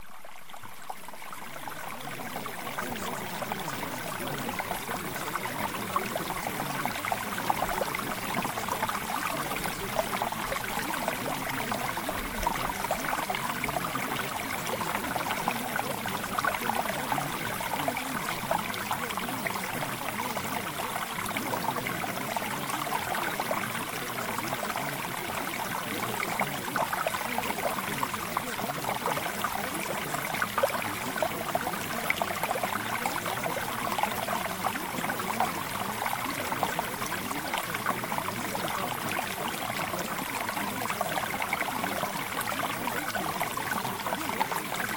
Mountain Stream

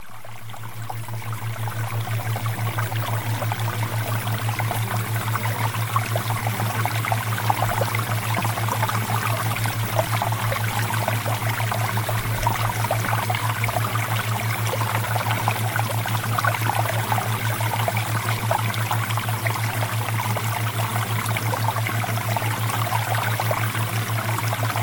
Water Theta Waves; Premium Subliminals

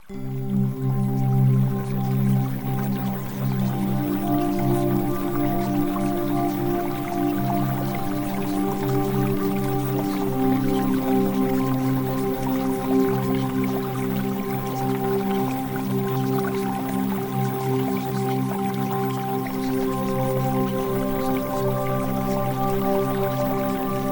Music; Premium Subliminals

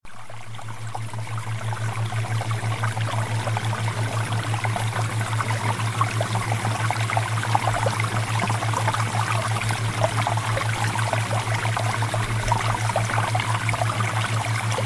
Water Theta Waves; EMOTIONAL HEALING; Premium Subliminals; Emotional Mastery

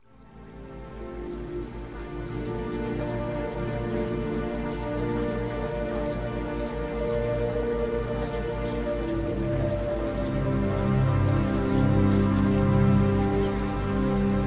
Music

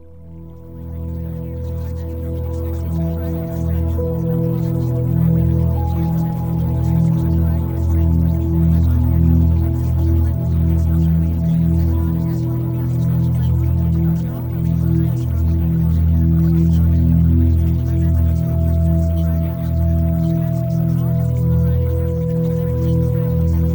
Music; TESTOSTERONE BOOSTER; Premium Subliminals

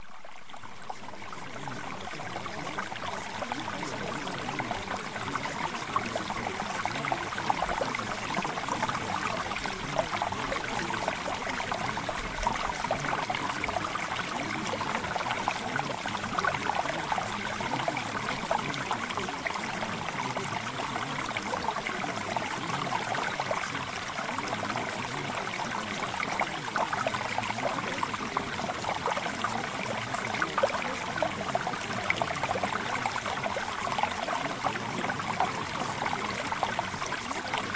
Mountain Stream

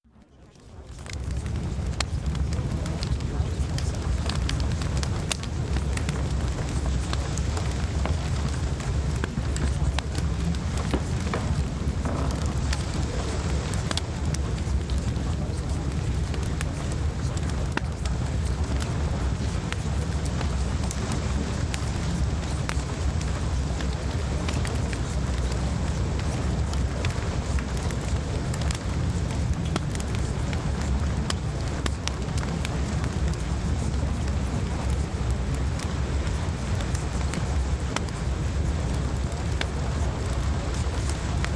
Fireplace

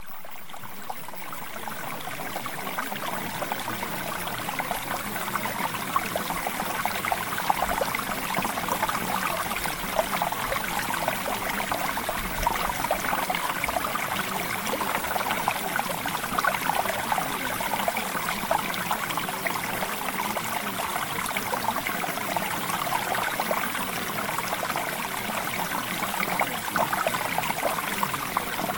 Mountain Stream; Premium Subliminals; Emotional Mastery

